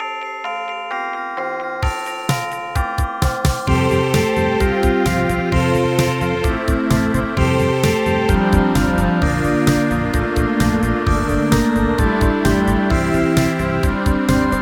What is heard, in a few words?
jingle bells